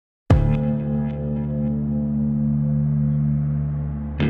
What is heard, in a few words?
Tension Bridge 120bpm 2bar; project zomboid